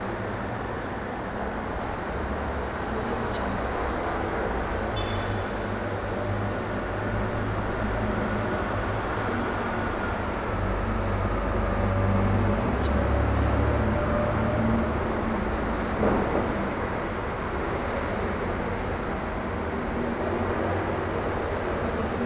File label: heavy italian urban traffic